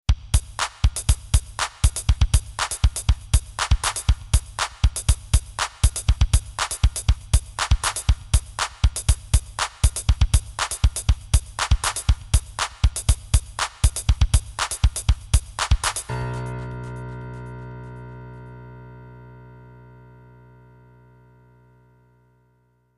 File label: jingle